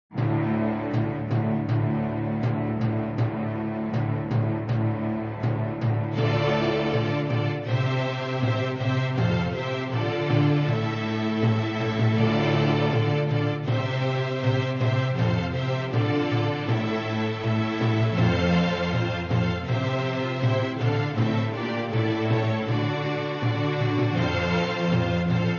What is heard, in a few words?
Strings; Music from Danserye